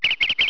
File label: bird; Deers Revenge